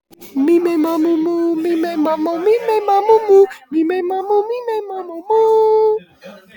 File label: big kitten